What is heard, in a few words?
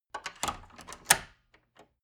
wood door old lock turn key